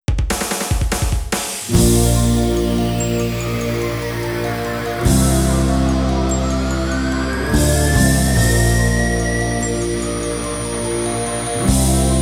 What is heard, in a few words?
short ver. instrumental with chorus; Koisuru Otome to Shugo no Tate; shield nine